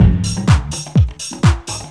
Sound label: funky grumble, Deep House 125bpm